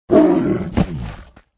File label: tiger death